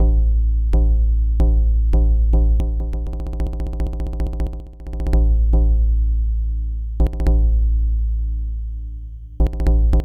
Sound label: Drum Roll